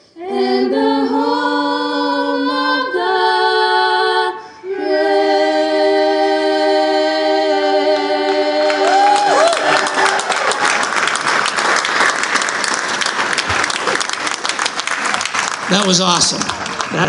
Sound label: national anthem; Pentucket